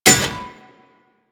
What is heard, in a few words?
Melee Axe Impact Generic; Warframe; Weapons; Axes